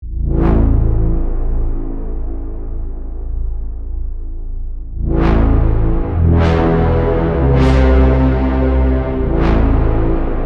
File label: Brass Power, bass synth; Wave Alchemy - Bassynth 1.1.0